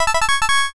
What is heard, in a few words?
charge trumpet